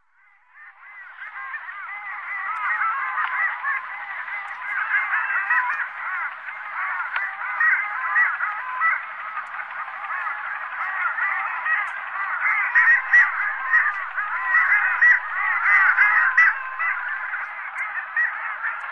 Clangula huemalis; Sakhalin; Kuril; sea; priroda sakhalina i kuril

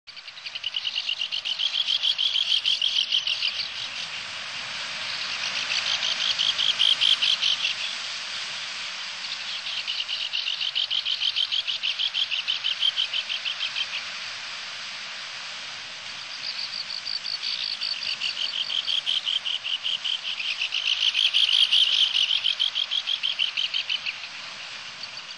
Aethia pussila; Sakhalin; Kuril; sea; priroda sakhalina i kuril

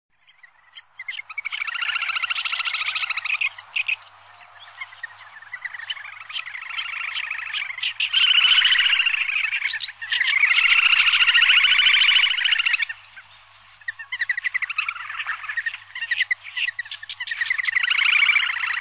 Tachybaptus ruficollis; Sakhalin; Kuril; sea; priroda sakhalina i kuril

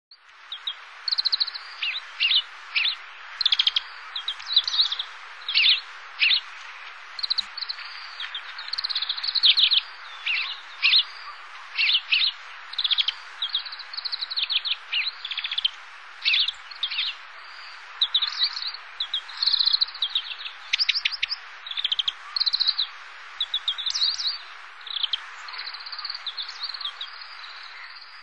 Chloris sinica; Sakhalin; Kuril; sea; priroda sakhalina i kuril

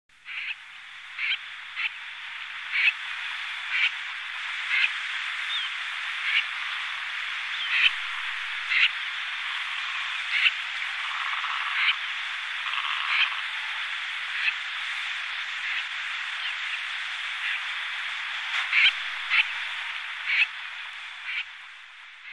Gallinago gallinago; Sakhalin; Kuril; sea; priroda sakhalina i kuril

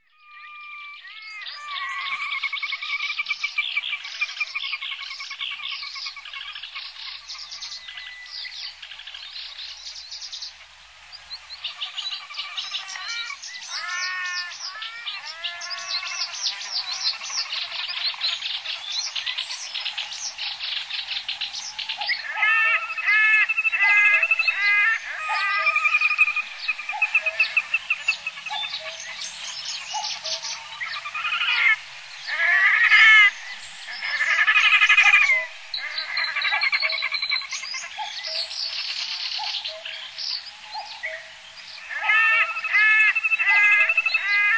Podicept grisegena; Sakhalin; Kuril; sea; priroda sakhalina i kuril

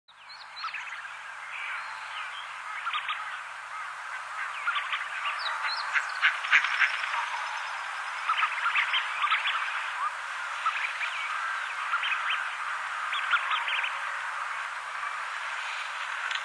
Aythya fuligula; Sakhalin; Kuril; sea; priroda sakhalina i kuril

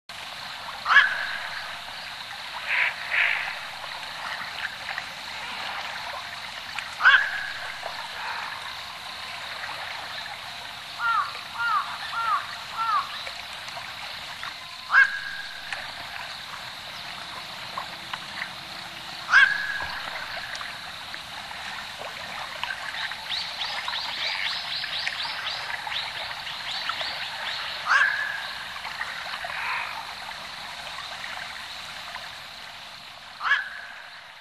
Ardea cinerea; Sakhalin; Kuril; sea; priroda sakhalina i kuril

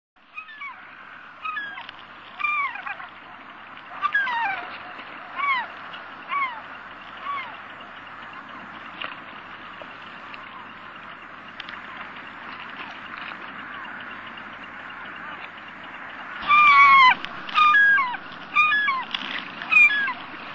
Larus schistisagus; Sakhalin; Kuril; sea; priroda sakhalina i kuril

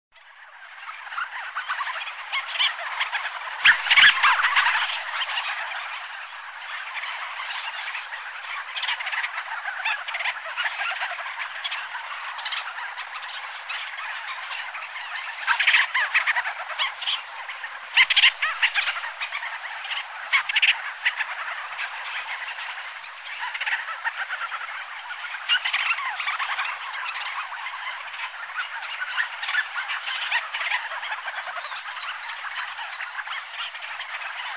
Oceanodroma leucorhoa; Sakhalin; Kuril; sea; priroda sakhalina i kuril

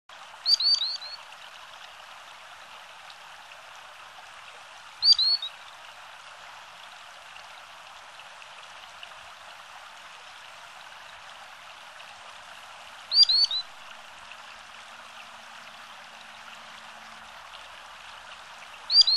Actitis hypoleucos; Sakhalin; Kuril; sea; priroda sakhalina i kuril

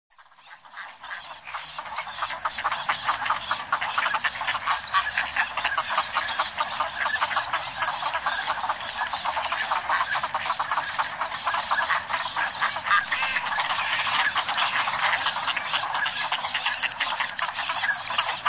Anas acuta; Sakhalin; Kuril; sea; priroda sakhalina i kuril

